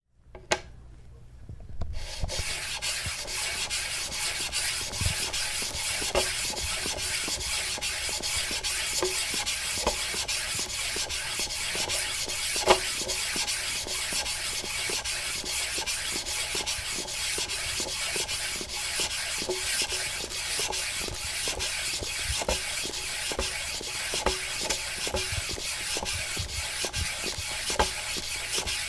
sukeeto wo togu oto; スケートをとぐ音; 小池真紀; Chino Cultural Complex; 茅野市民館

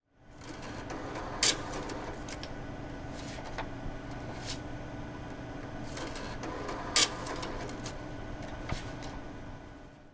taimu kaado; Chino Cultural Complex

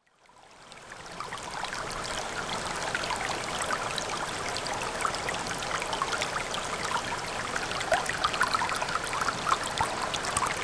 ooshimizu yuushutuon; Chino Cultural Complex

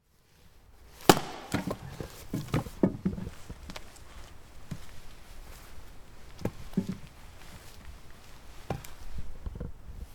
banshuu no mori makiwari; 晩秋の森「薪割り」; Chino Cultural Complex; 茅野市民館

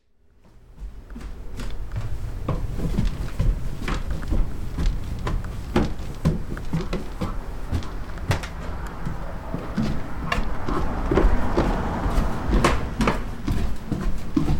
mokuzou no kaidan; Chino Cultural Complex

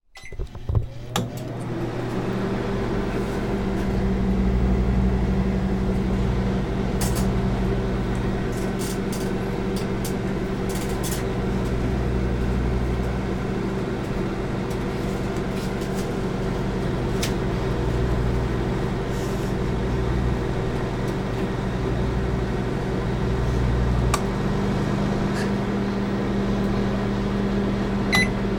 denshi renji; Chino Cultural Complex

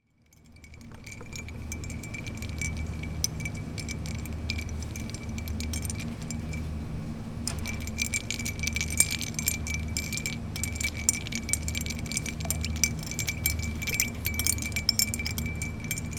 koori kakimazeru; Chino Cultural Complex

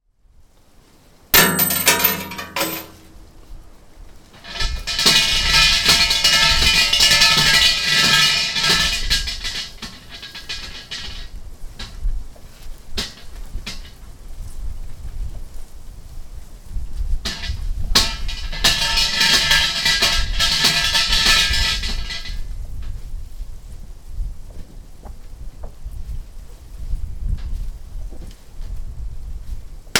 omairi jinja; お参り（神社）

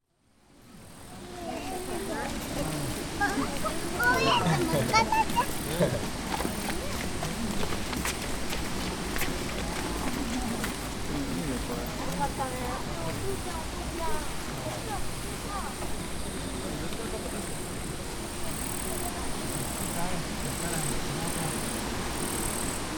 uchiage hanabi mushi no koe; Chino Cultural Complex

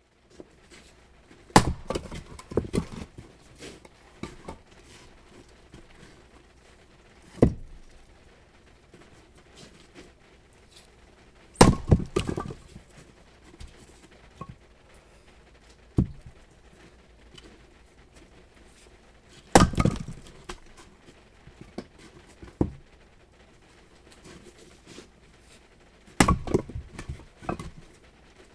sara ni maki wo waru oto; さらに薪を割る音

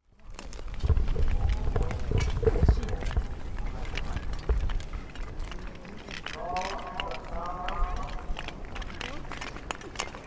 sukeeto rinku no oto; スケートリンクの音２; Chino Cultural Complex; 茅野市民館

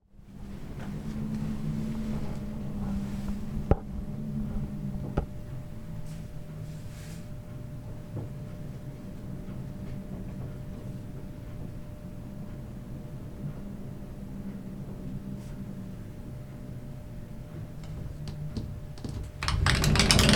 mado ni ataru kaze no oto; 窓に当る風の音

